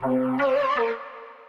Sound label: flute, ethno, 160, C